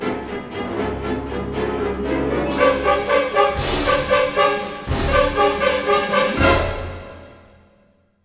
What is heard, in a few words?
Orchestral Loops